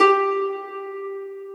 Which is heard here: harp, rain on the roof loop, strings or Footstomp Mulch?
harp